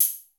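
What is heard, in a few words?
analog closed hat; 90 sSampleCDs; Crypton - DrumBase5000; ANALOG KITS1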